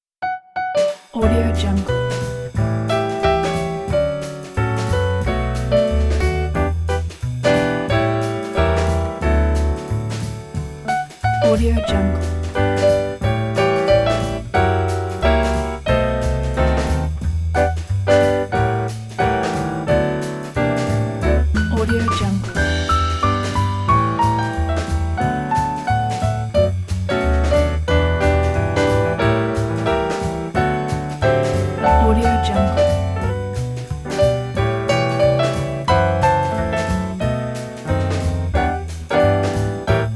jazz cafe; mezzosound

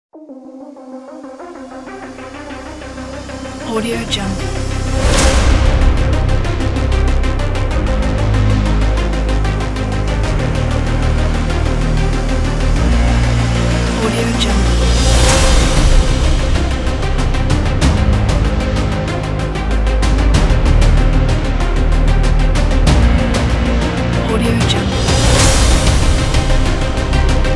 epic and modern heavy blockbuster

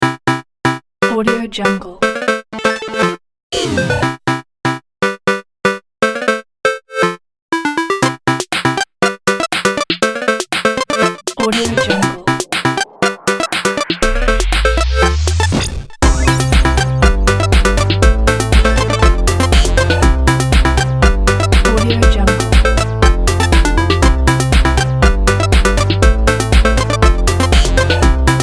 8bit game

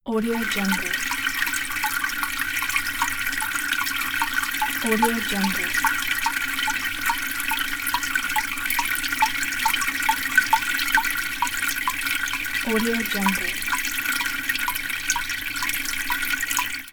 water fall sound

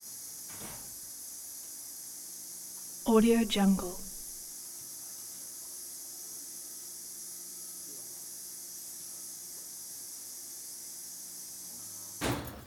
hydraulic motor hiss whirr clunk

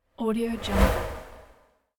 sweep swipe swoosh

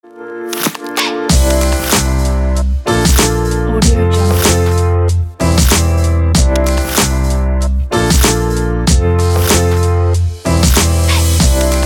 uplifting hiphop

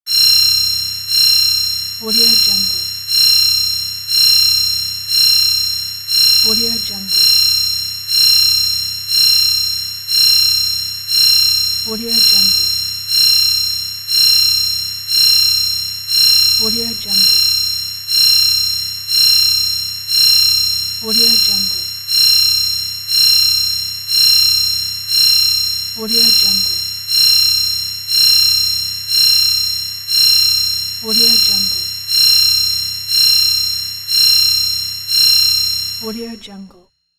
forcefield scan

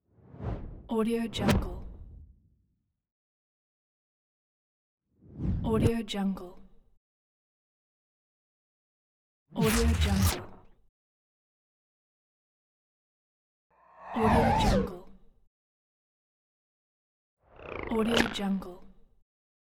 fast movement

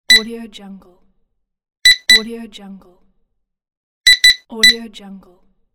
cash register scan beeps

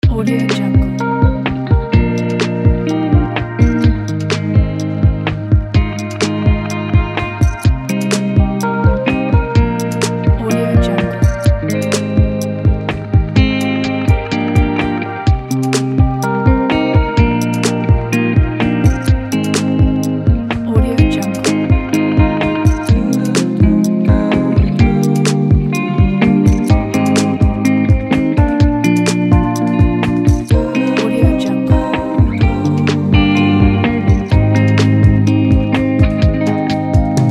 laid back wavy indie pop

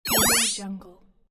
upgrade

